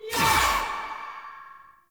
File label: Choir Erupt Proj Throw